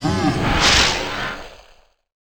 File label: Zetso War idle